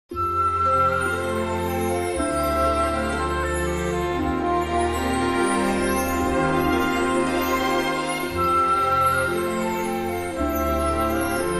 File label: Choir And Orchestra